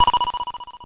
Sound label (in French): ping Mur